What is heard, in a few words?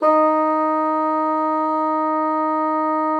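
bassoon mezzo D#4; Xsample VOL-6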